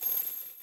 Perc Shaker

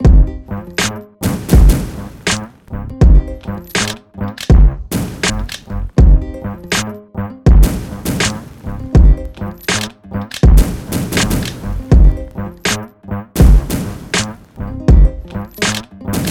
Gangsta Loop

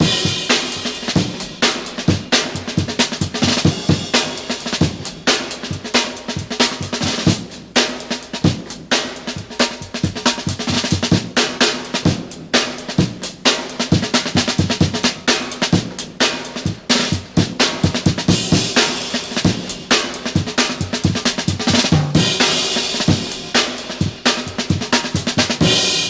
Soul Drum Loop Amplified

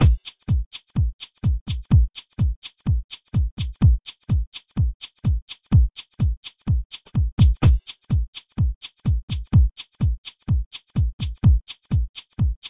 Drums

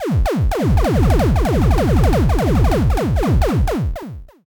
Destruction 8 Bit

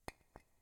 Rocks

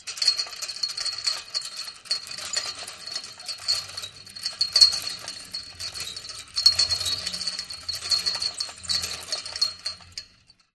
Rattling Chains

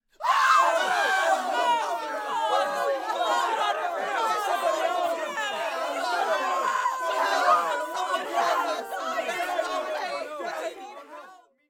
Crowd Scared Swell

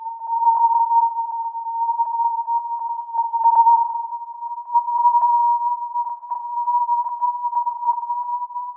Alien Space Sound

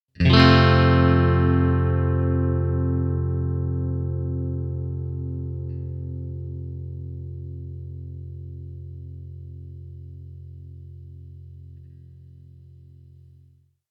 F Major Chord Ringout

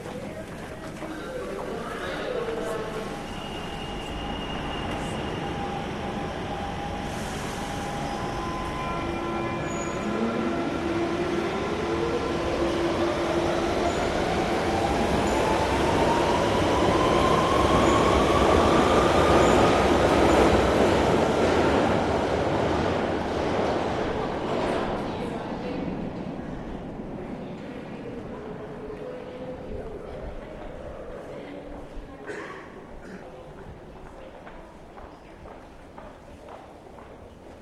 Train leaves without me

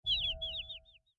Skill Dizzy

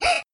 Cartoony Gasp

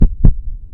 Heartbeat Loop

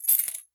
Coins Medium Sound

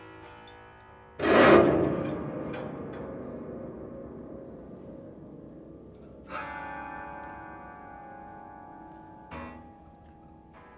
Scrape Sting

